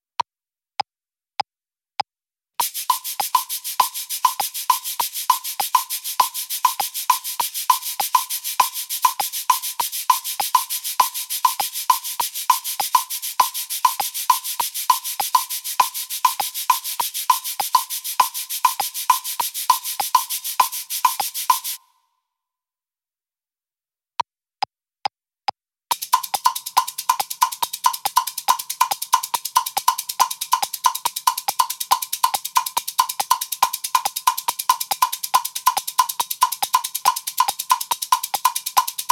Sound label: Cabasa Foot pedal